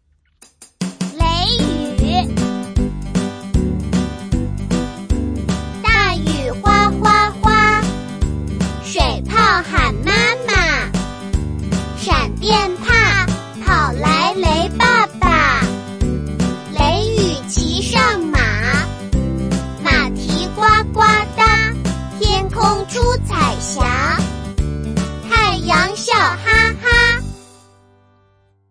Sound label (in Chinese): 童谣; 雷雨; 中文童谣